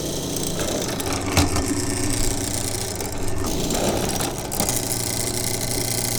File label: drill wood